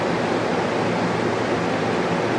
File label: fan